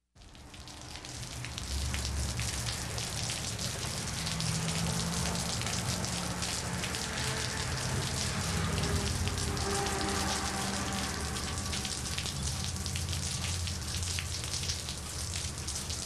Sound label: Rain